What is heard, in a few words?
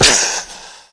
spiderling cast; weapons; dota; broodmother